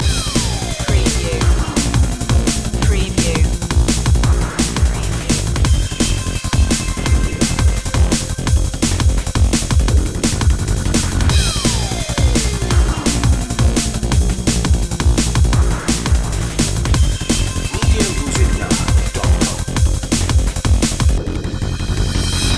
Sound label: vital beats loop